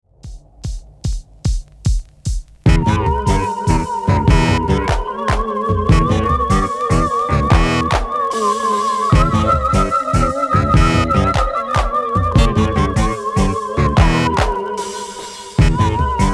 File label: musical computer